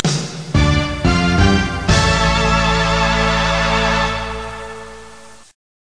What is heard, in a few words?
Match Over; battle r; From Dancing Cats